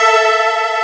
HOUCEY CHORD; AKAI S6000 CD-ROM - Volume 1; SYNTHESIZER

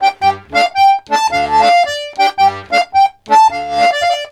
RONDO, Accordions; USB Soundscan vol.40; AKAI

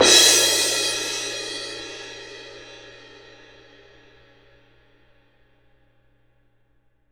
CYM F, Percussion; ILIO; Synclavier Percussion 2; World & Orchestral

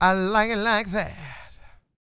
SING W, Vocal Planet Blues & Soul, BLUESY; ILIO

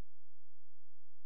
A#, FX; Big Fish Audio

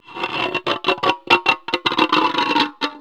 BONGO SCRAP; ENVIRN-AMB

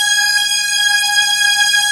synth disco, dance synth; Sound & Vision - Gigapack I CD 2 (Roland)

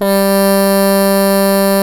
G DRONE; Midjwiz & Shaku

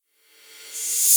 Reverse Cymbals; Vengeance - Essential Clubsounds Vol.5

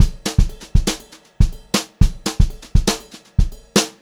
Brushed Drum Loops; USB Soundscan vol.39; AKAI; 90 s Sample CDs; 120 HOTROD

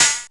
ROCK PERC.

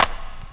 KIT, Dance Mega Drums; Best Service

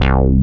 VX BASS, FUNK

